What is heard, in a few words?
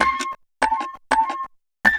Player Piano; Electronica; Textures